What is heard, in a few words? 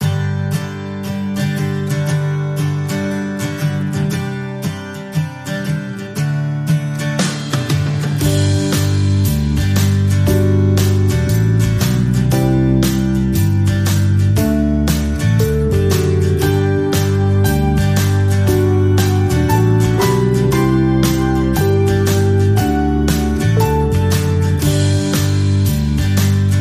No Lead Guitar No Bells